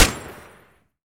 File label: Weapons M60 fire end; DCS